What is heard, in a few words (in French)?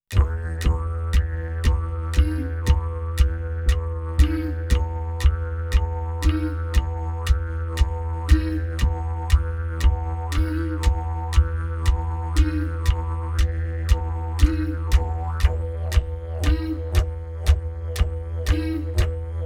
Musique Ethnique Australienne